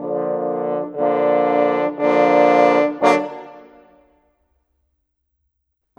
Horns, Orchestral, Rock Pop

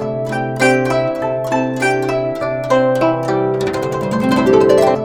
GUCHIN 100 C; INIS - Opium

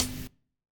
KIT, Dance Mega Drums, HIP; Best Service

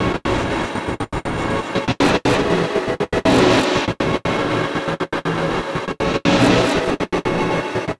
Envelope Beat; Drums & Drones

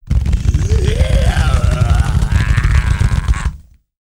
CHEST ROLL, World Voices, Afro noise; ILIO; Vocal Planet VOL-5

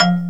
MARIMBA F-1; AKAI S6000 CD-ROM - Volume 1; Piano; MALLETS

